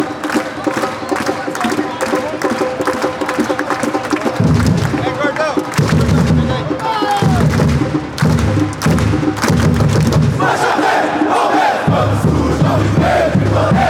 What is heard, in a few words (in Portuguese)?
bateria, chants; palmeiras